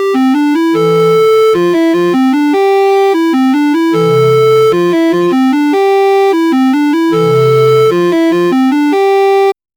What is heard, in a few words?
main menu music for pixel game